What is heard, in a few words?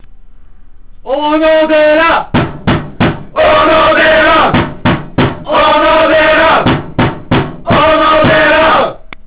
call